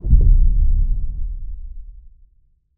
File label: Explosion Deep